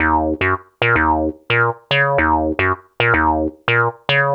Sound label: 110 D Funny Lead